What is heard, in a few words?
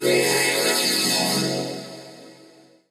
deep, notifications; Sony